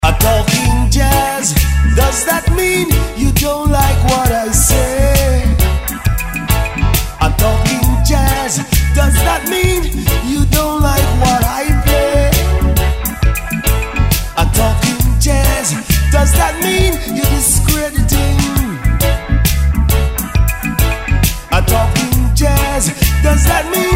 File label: jazz